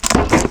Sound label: launcher switch; cdogs